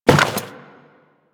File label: Worker Step Default; Solaris; SolarisWorker; Steps; Warframe